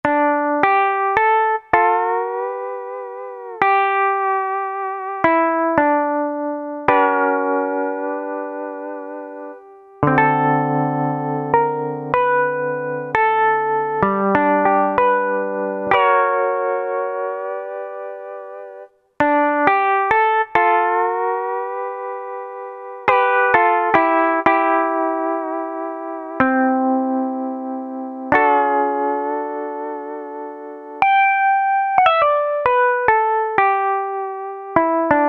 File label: Pure Steel; Roland SR-JV80-17 Country Collection